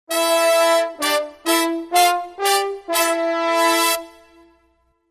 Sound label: The Kings Fanfare